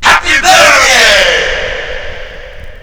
BIRTHDY1; L'Effet Pommier - Volume 04

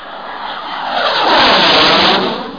Jetplane Passing

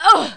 DOMINA KICKED; Messiah; The Games Machine 76

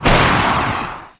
rocket; Messiah; WEAPON; BAZOOKA

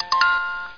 music box

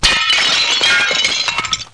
smash glass

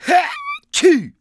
sneeze, English Voice; The Sum of All Fears

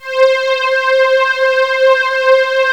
Synth Strings; GMTones; Ensemble

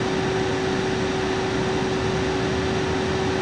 lift amb; Days of Oblivion

